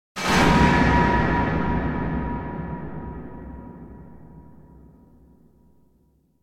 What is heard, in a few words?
Impact Metal Drop Distant Shock Hit Strong Metal Strike - Reverberant; Hollywood Edge; sound designer's toolkit v2